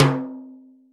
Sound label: Huge Tom Sound C# Key, Royality free tome drum sample tuned to the C# note. Loudest frequency: 1245Hz